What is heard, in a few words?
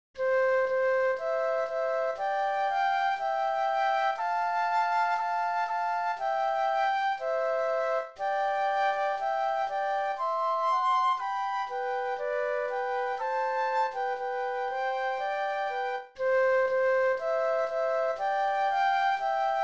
Flute Duet